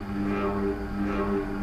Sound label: Fan Antique Start Loop, Slow; Fans